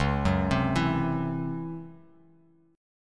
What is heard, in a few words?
C sharp Minor Major 7th Double Flat 5th Bass Standard; C#mM7bb5; arpeggiated; JGuitar